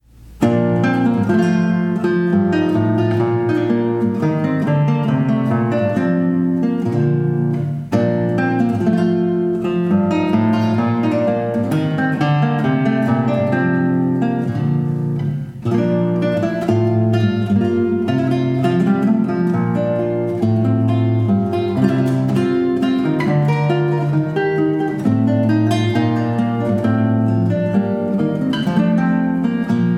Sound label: Gavota; Losy